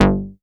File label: MOOG BASS G2; Best Service Dream Experience